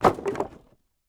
IMPACT Wood Plank On Wood Pile Smooth; mono; IMPACTS; Universal Sound FX

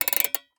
Alarm Clock Wind; Blastwave

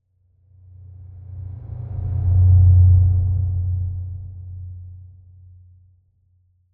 Whoosh Dull Dark Distant Scary - Sub Passing; Hollywood Edge; sound designer's toolkit v2

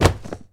Book Drop Carpet; SFX Kit; Foley - Misc

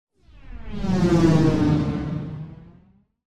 Passing Space Ship - Buzzing Engine; Hollywood Edge; sound designer's toolkit v2

